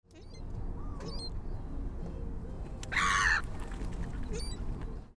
Black-headed Gull, MD

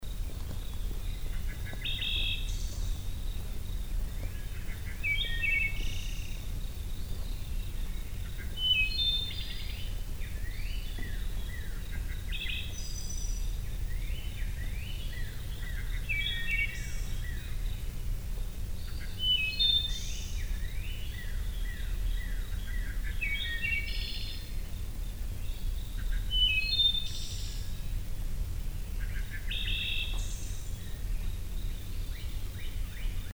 Wood Thrush; Millington Kent MD

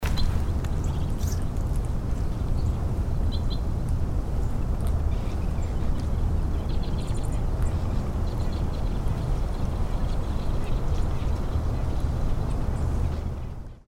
white-winged crossbill flight

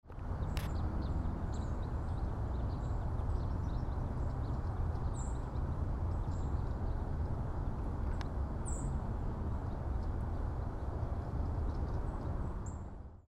Red Crossbill; Pasadena MD

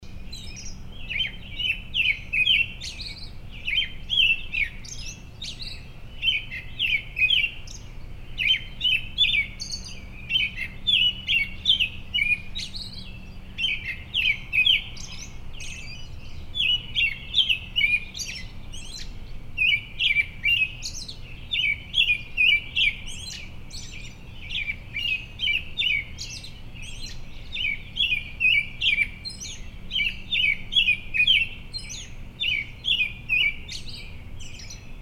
American Robin predawn

